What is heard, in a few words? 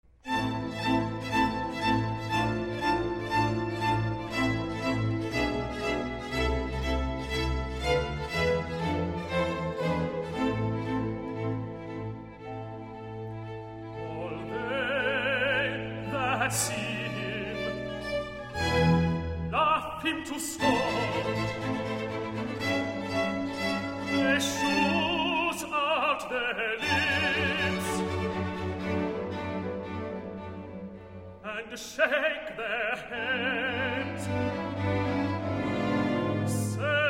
All the that see him - Tenor Recitative; Boston Baroque; Handel Messiah